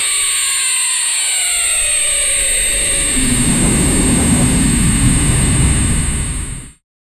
laser lightning, electric

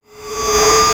diabolic and magical vacu, magic

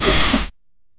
bow draw, bow and arrow

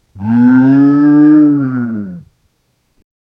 realistic camel grunt wit, desert

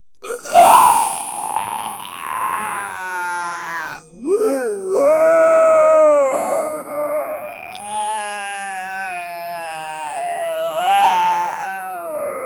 scream of zombie

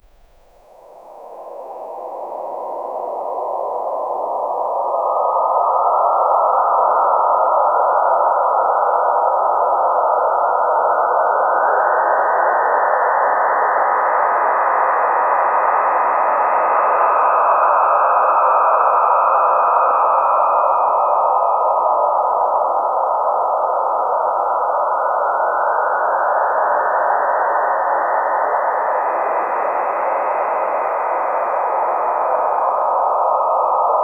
soft whoosh for strokes

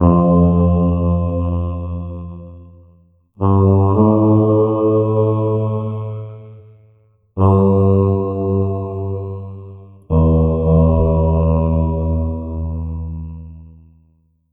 low ominous background mu, dramatic

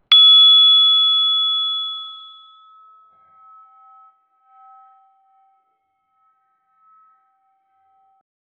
fighting ring sound; arena

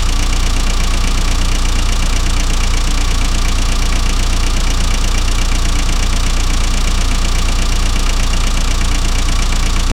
loop car engine sound mor

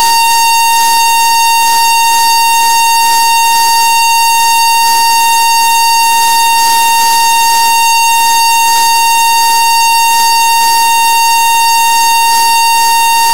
create alarming music not

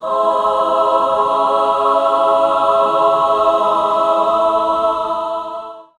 angelic choir aaaaaaah

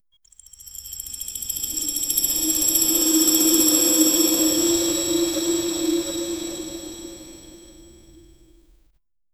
produce soft silk movemen, cloth

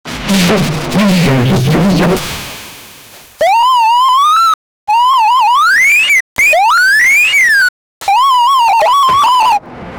sound effect for a snakes

